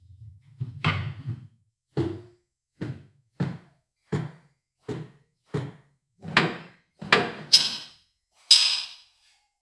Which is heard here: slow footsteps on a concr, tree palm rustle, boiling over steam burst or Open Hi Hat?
slow footsteps on a concr